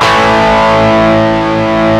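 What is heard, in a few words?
DISTORTION CHORD A1; WS#4 ROCK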